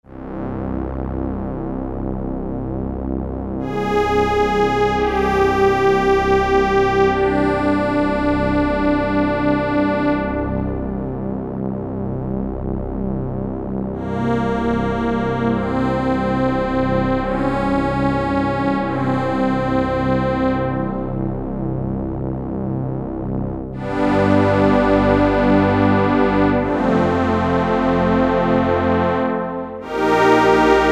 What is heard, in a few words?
PAD, Akai SG01v; OBIE X PAD